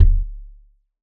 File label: glass, footsteps; player; Dystopia